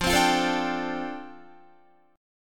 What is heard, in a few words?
F 6th Add 9th F down Guitar, chord